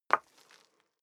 step high heels wood parquet slide long; schritte

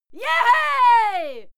female cheer yay; schreie; menschen

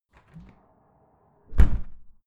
window open close soft; objekte; fenster

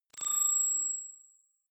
sci-fi hint; soundpool

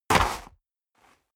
step boots gravel light jump

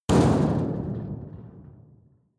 metal impact sheet rumble rattle medium; hits explosionen; metall

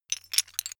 metal pieces clatter short

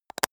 makeup box close short; haus-garten; badezimmer

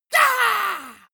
monster growl attack high; schreie

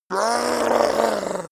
yeti grunt pain anger

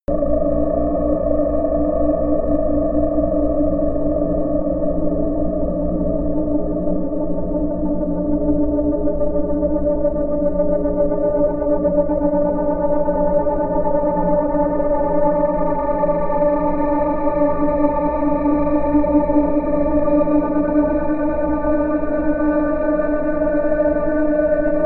drone scorch high

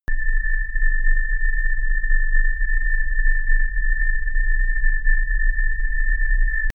space lost in vastness loop

